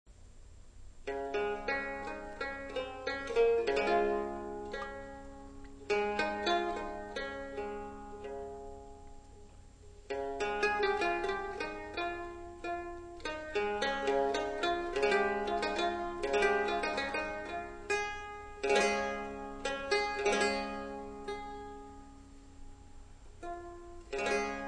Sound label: citole; instruments medievaux